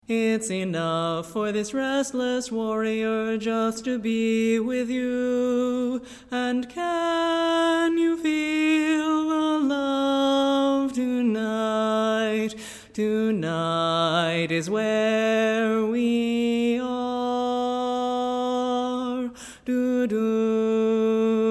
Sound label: Bass